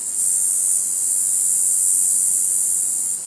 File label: antlion hill ambient, coast; source engine; hl2; video games